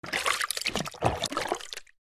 Item movements in plastic container filled with water